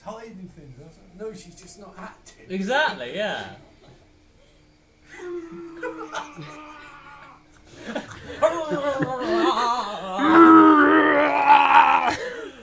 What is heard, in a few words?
brrrrrrrrrrrgh